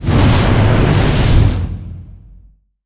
Monster Cutin Fire

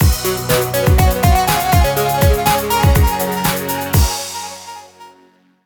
Level Completed; traxion 2

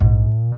Bass Missed note; The Princess and the Frog; wii windows; Hub Swamp; Music Ray

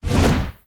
Punch; ChooChoo Heroes

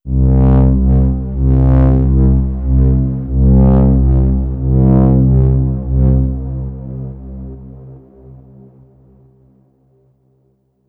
Downtown Overlay Drone

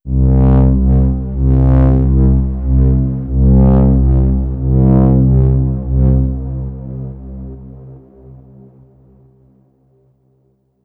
Downtown Overlay Drone

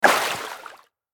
Small ship Hit

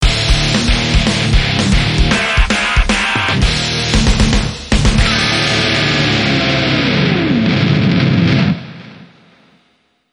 Failure; the king of fighters all star; android; Dungeon Core

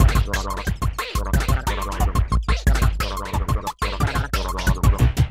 Cartoon

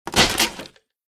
Break Success; Still Life

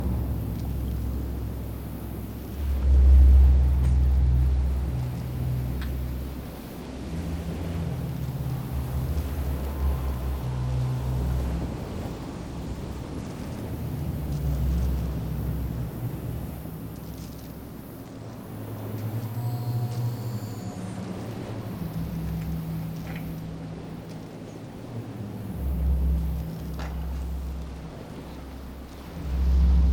ambience; lego batman 3 beyond gotham gamerip

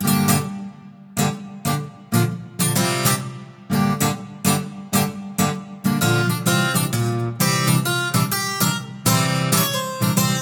Guitarguy Solo; west of loathing

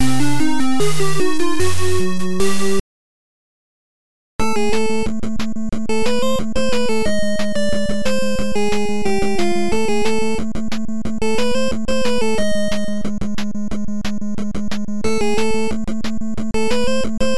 Boss BGM; Pocket Zaurus Ju Ouken no Nazo; Family Computer

